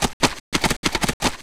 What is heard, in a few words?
attack; Rust